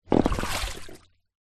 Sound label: transport boat rubber dinghy lift out of water